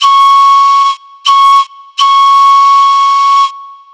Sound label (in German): D Pfeife kommen